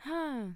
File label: achievement low; VIVAE